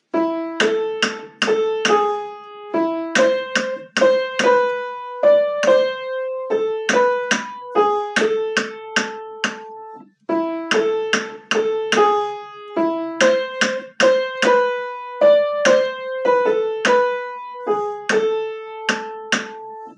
melodia angielska

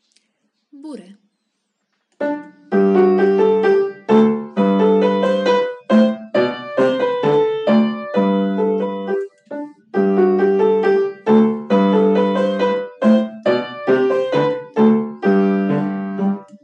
rytmika Bourree

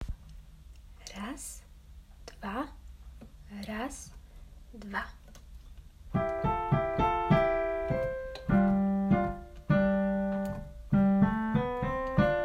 balerinki rytmika

